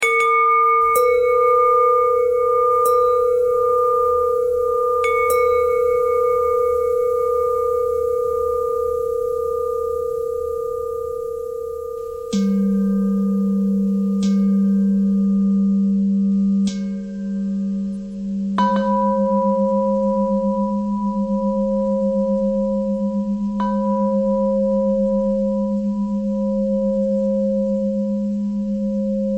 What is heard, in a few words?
bell threads